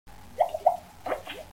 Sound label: xiaomi potain message ringtone